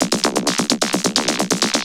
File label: Vocobeat; Sonic Foundry (Sony Creative Software)